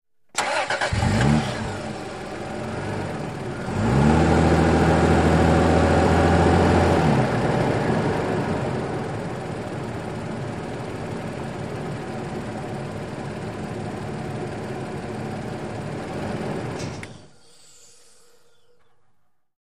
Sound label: SUV Engine Accele